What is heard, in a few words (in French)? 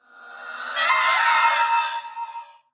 Banshee Cri; Phasmophobia